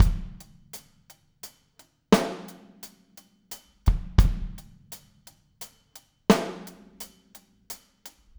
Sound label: Chorus, Full Mix, Drums; Sonic Foundry (Sony Creative Software); Drums from the Big Room The Mixes; Demo Projects